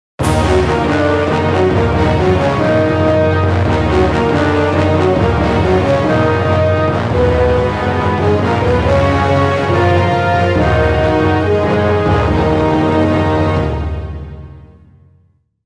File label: Defeat; Angelic Vale